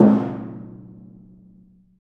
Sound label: Af. Hand Drm, Ambient; Roland LCDP14 Africa VOL-2; Ambient Tar